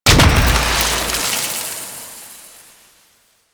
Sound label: Gardening Cactus Explosion; Warframe; Duviri